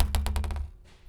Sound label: Bouncy Ball; BB Retail Therapy Sample Pack